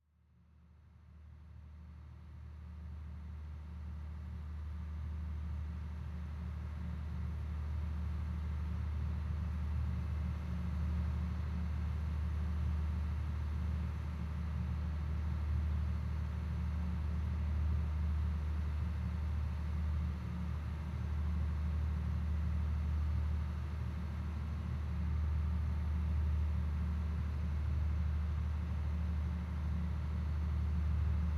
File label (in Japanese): ASMR スナメリウオッチング（イルカウォッチング船環境音）